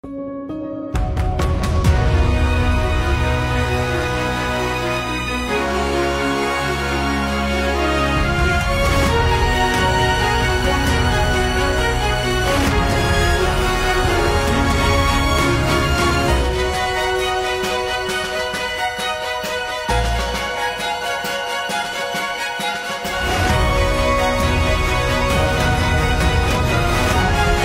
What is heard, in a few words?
ORCHESTRAL